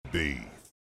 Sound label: Beef Tone